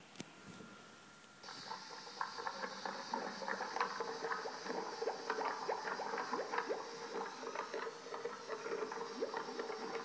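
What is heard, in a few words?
sink empty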